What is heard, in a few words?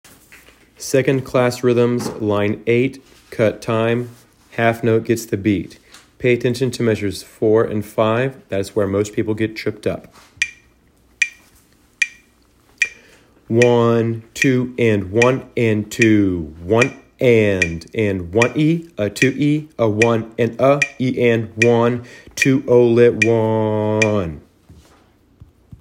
rhythms